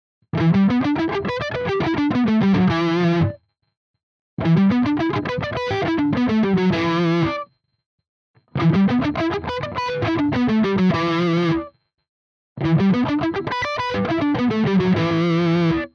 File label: FRM300 rhythm Arpeggio